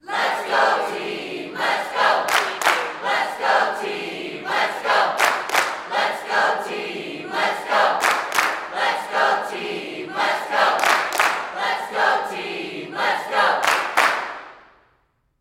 Sports Crowd Cheerleaders Chant Lets Go Team Lets Go With Clap